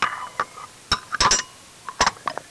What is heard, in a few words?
metal balls rub